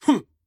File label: attack; Elder Hu